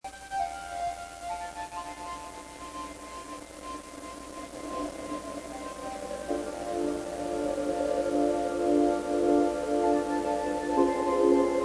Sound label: Spooky Synthesizer